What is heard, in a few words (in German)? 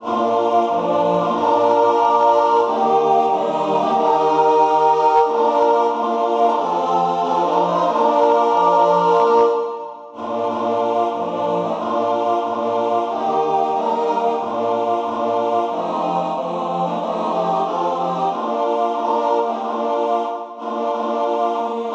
gemischter Chor vierstimmig